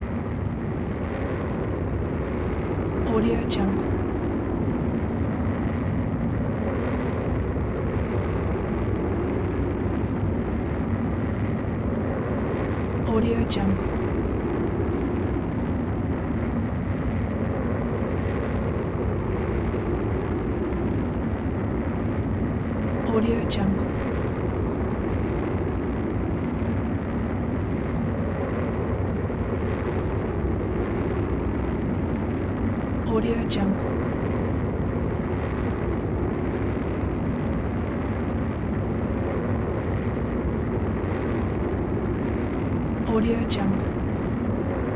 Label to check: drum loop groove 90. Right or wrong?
Wrong